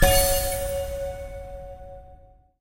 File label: small Win; parthenon